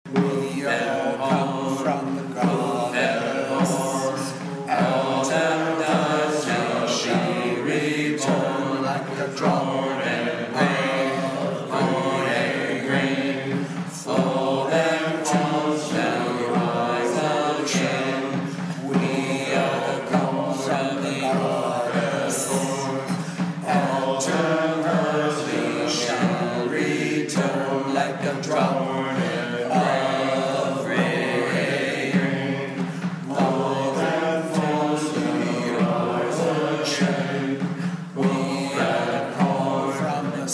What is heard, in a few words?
We All Come singing, hymns